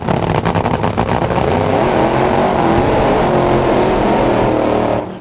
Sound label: fuel car